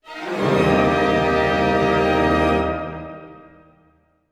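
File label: orchestra; Masterbits - Switch FX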